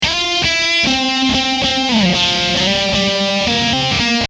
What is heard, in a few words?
echo